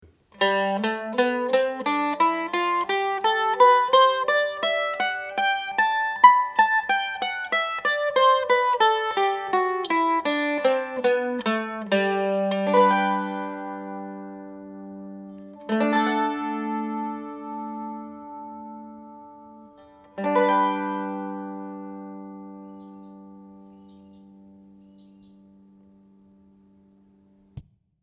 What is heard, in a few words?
scale, mandolin